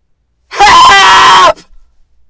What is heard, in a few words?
Screaming Help